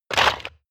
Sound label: crab movement cracking short